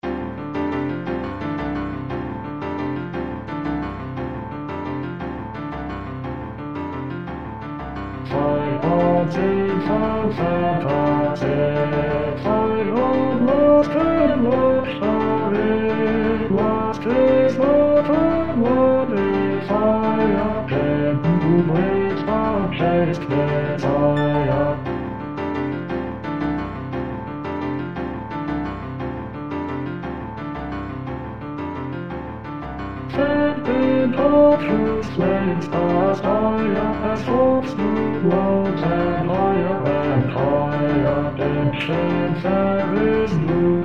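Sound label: Fie on Bass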